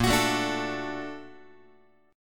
A sharp Augmented Major 7th A sharp down Guitar, A#+M7 chord; strummed; JGuitar